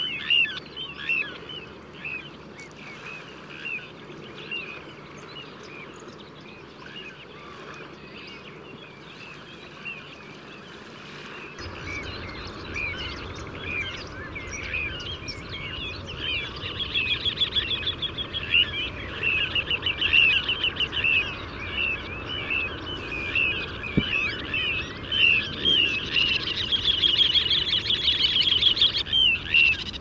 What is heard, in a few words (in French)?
Petit Gravelot